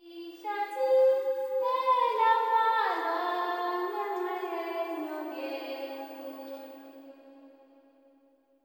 LTLE GIRL, AFR.GIRL

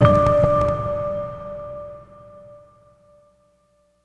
Ambient Hit, SFX, One Shots; Sonic Foundry (Sony Creative Software); Electronic Point Blank

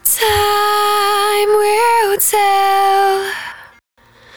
110bpm Ab, Velvet Vocal; Zero-G

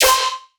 synth hit tech yell, F; Virtual Riot

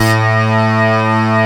OB KNIFE A2, OB Synths, Analog; E-MU Formula 4000 Series Vol. 3; Analog Odyssey

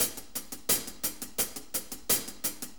4-4 HiHat Loop

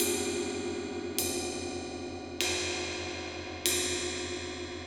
Wind Gong Cymbal; Metal; Sonic Foundry (Sony Creative Software) - Ambient Atmospheres & Rhythms; Ambient-Metals

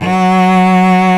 Arco Cello F#2; Multisamples; Proteus 2000; Digital Sound Factory Proteus 2000

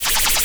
noise fx; Masterbits; Switch FX

